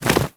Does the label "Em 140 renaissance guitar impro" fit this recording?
No